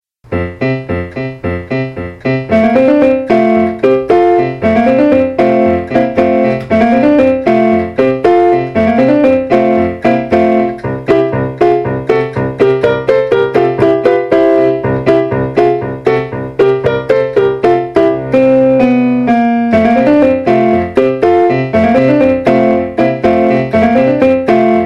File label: music; powerdirector